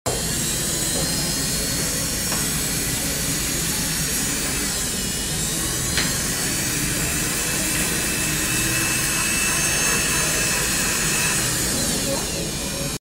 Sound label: Electric nail file; سوهان برقی ناخن